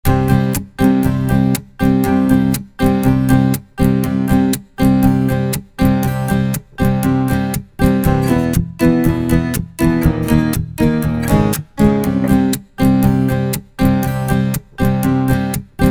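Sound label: Unmix Drums, Strummed Acoustic; Transientenwerkzeug; Zynaptiq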